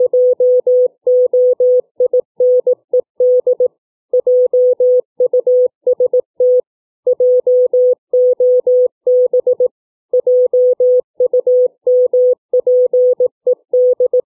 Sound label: letter filtering